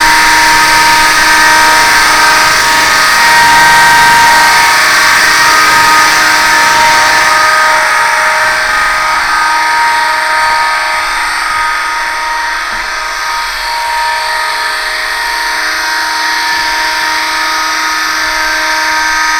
walking around apartment while fire alarm is going; Fire Alarm Noise Wall; lolicore